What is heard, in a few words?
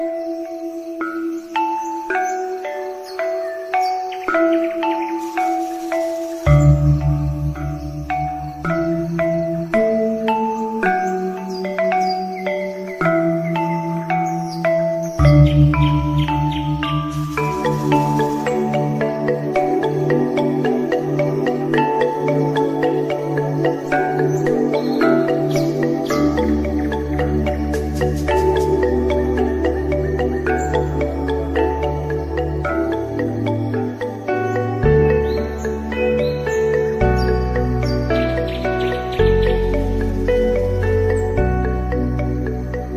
balinese instrumental